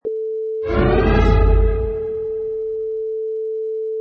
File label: Orchestra Horror Sting